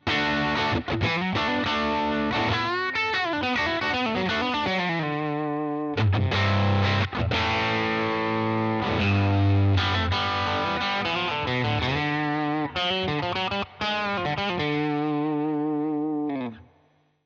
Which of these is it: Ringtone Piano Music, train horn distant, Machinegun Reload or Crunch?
Crunch